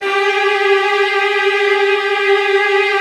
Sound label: VN TRILLS, Virtuoso Strings